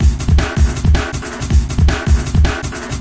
160 dnb beat; drum loops and breaks; 141 to 160 bpm

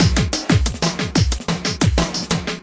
ardkore junglist, drum loops and breaks; 181 bpm plus

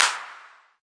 CP, Claps

